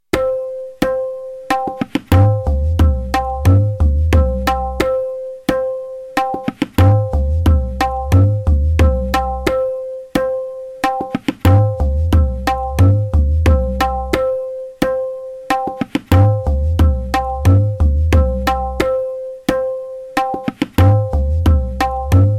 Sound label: rupak madhya 90bpm